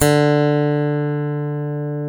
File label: EL A GT1 D2; AKAI S-Series CD-ROM Sound Library VOL-7; A GUITAR 2